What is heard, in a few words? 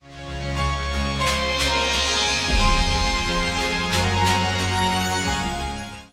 Electro vocoder; time stretching technique